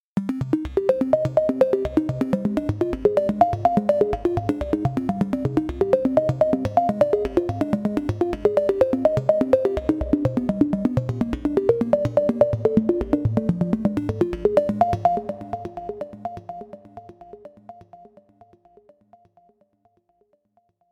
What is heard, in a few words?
Crushed Phaser